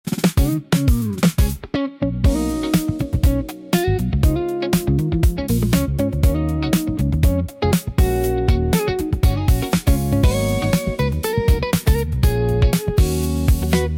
Happy Birthday Funk Guitar Rock, instrumental Version